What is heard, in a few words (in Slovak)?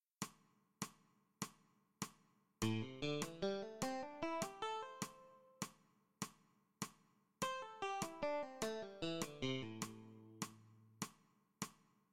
osminove trioly priklepy uvolnenia; cvicenie